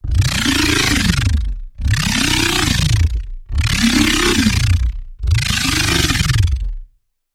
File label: Growl - Mechanic Beast, Serum 2; Growl - Mechanic Mystical Beast; Routed Sound; Serum 2 Expansion - Chaos Bringer Vol.1